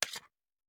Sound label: Hand Gun Foley; Sound Ideas; Guns